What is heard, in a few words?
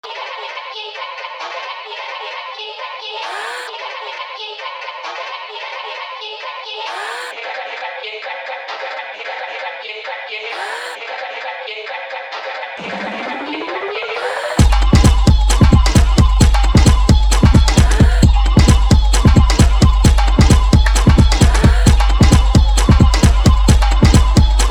Instrumental; BodyJam 83